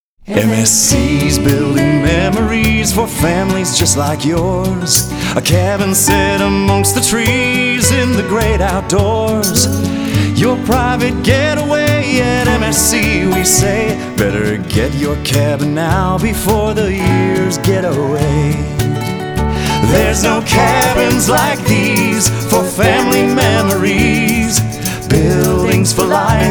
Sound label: jingle